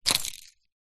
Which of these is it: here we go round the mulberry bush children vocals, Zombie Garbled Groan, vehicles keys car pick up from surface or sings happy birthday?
vehicles keys car pick up from surface